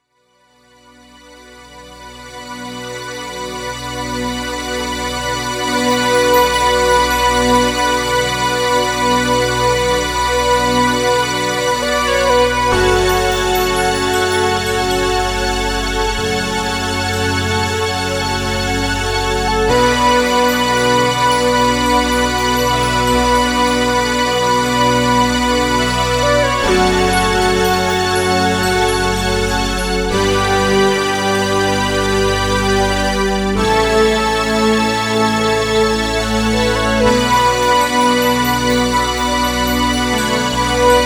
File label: Strymon Big Sky Hall Strings